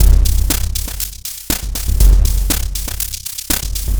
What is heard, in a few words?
Noise Beat; Drums & Drones